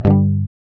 GUI G, Acoustic

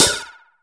HH OP; USB Soundscan vol.20 - Fresh Disco House I

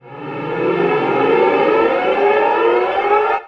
NOISE, Production Effects

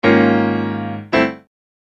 Acoustic Piano; Construction Kit; HIP-HOP; Sonic Foundry (Sony Creative Software)

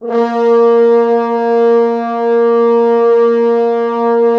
Orchestral Brass

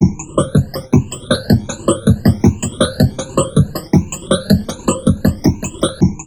FLUID 160, Drum Loops Crazy Processed; USB Soundscan vol.07; AKAI

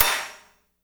HAT SNR1H R; Spectrasonics; Burning Grooves CD2; KLANKY KIT

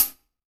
HH, Drum Mashines; EdgeSounds

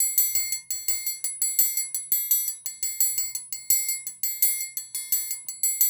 loop, percussion

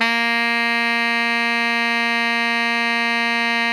TENOR FF A#3, saxophone; Greytsounds; Philip Wolfe David Crozier's Saxophones

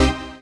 HOUSE, House Dance

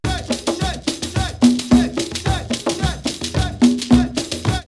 Lomé 108, drumloops World & Ethnic; X-Static Goldmine

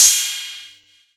Yamaha EX-5

